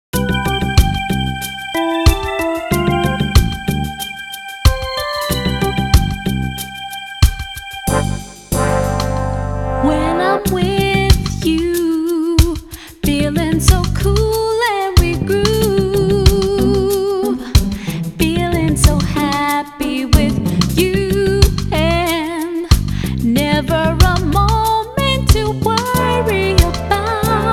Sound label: Reggae; pop n music; dreamcast